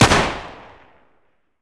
1911 fire; pistol m1911a1; garrysmod

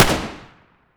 ots33 fire, pistol; garrysmod

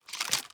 spas insert shell; weapons; garrysmod

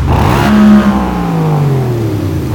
Rev short loop, vehicles Caterham; garrysmod

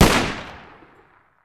sako fire; sako92; garrysmod

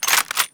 uzi bolt pull, weapons; garrysmod

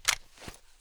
mp5 mag out, weapons; garrysmod

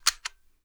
m24 load, sniper; weapons; garrysmod

